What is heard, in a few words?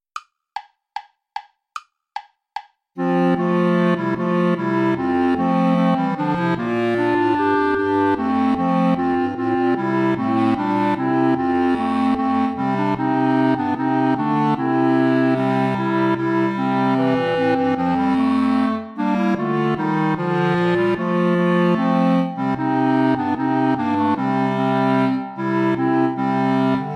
bourree play along without clarinet; praetorius michael iii